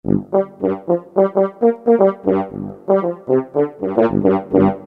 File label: FR.HORN; Orchestral Winds; Roland SN-U110-06